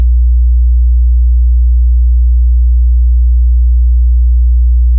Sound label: 60hz